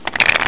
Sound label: TOSS